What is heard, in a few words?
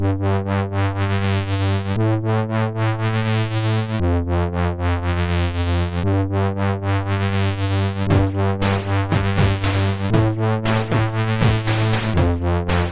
sid MOS6581R4; Joe Blade 2